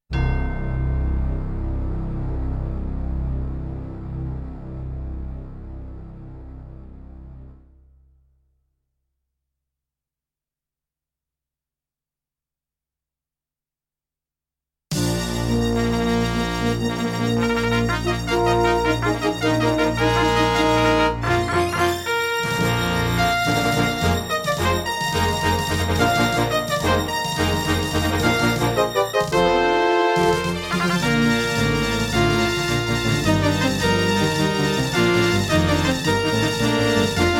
Intro, Yamaha CBX-T3; Dark Forces

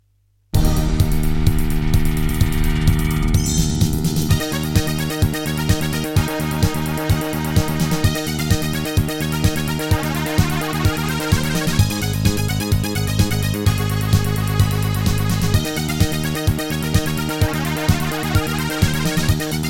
Terratec MiniWaveSystem WT64; Wacky Wheels - Turbo

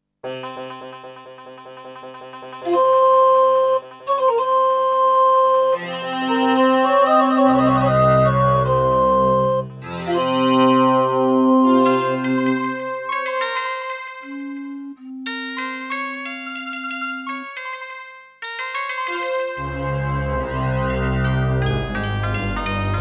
Intro, Terratec WaveSystem SCW001-2; Prince of Persia 2 - Intro

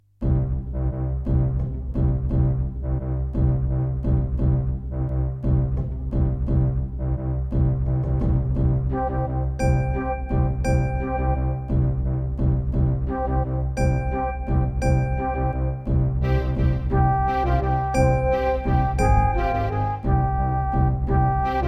Terratec MiniWaveSystem WT64

